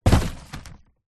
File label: impact cardboard box